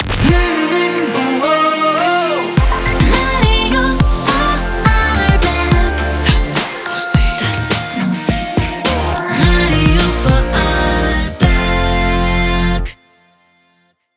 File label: Kickass Snare; Radio Vorarlberg